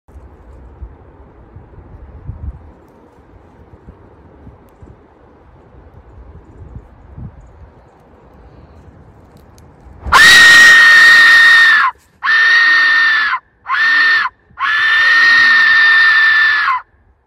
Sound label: Aztec Death Whistle; سوت مرگ آزتک ها